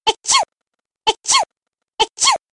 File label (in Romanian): hapciu 3x